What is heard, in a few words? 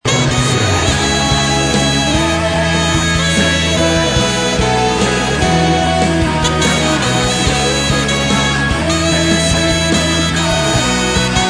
sax